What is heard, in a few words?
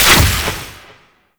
Conservation Orb Destroy; Warframe; Duviri